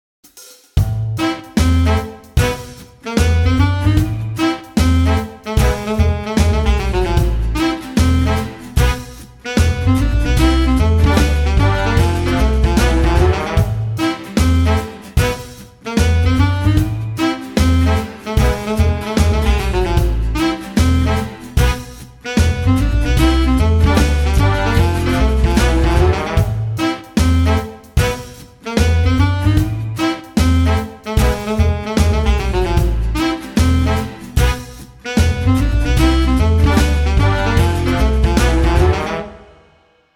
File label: Tango Foxtrot; Game Music